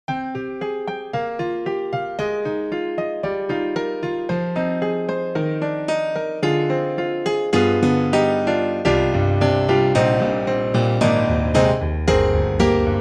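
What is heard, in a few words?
Rock Acoustic, piano; Roland; SRX-03; XV-5050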